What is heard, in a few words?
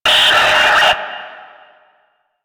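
Military Backpack Shutdown; Objects; DE; Warframe; Storage Container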